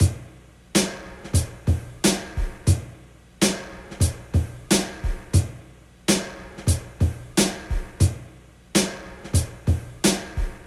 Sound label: Drum Loop 90 bpm